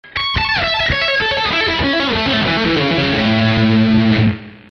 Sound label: Lick